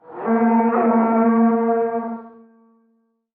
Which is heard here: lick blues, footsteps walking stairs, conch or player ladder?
conch